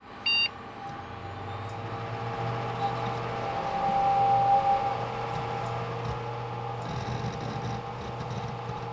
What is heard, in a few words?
start; Summer Catchers